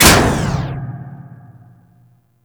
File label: Injection Arm Mover Stop; Ambience; Warframe; Grineer Ocean; Ocean Sabotage Injection